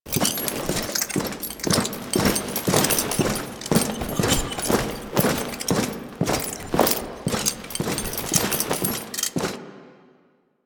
Horse Steps Gallop Loop Armour Foley; Vehicles; Duviri

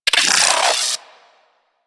Arachnoid Micro Vocal Pain; Warframe; Corpus

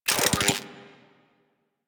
Spear Gun Charge Up; Warframe; Weapons; Corpus

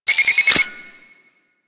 Fortress Mine Warning; Ambience; Warframe; Grineer Fortress

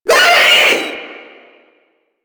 Proto Infested vocal short attack; Warframe; InfestedNinetyNine

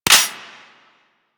Cowgirl Pistol 2D Mech A; Tenno

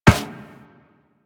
Run Metal Perforated, Footsteps; Warframe; Operator

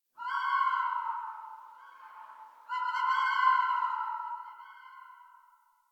Tree Distant Critters Scared, Ambience

